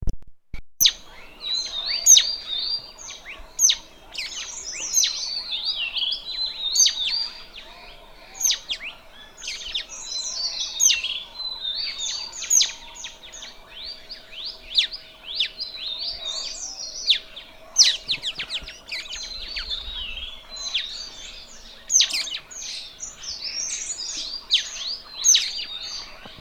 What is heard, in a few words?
Mountain Bulbul